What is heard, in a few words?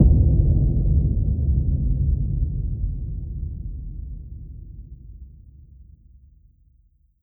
Malediction Boom; Legend of Keepers (gamerip) (2019)